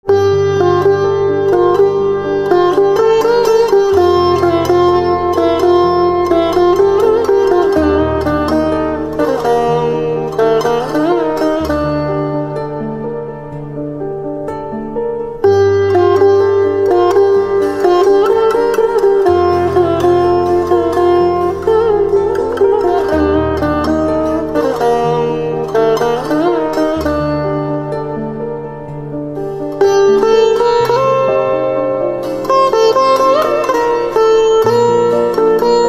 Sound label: Veena